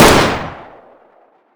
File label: mp5 40cal fire